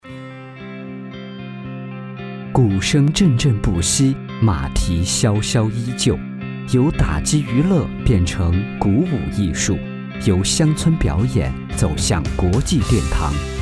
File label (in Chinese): 大鼓; 军庄大鼓